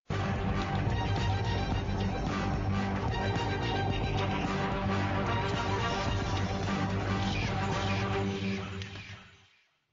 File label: Ring Tone